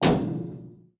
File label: Bump; Shift (Switch, Windows) (gamerip) (2024)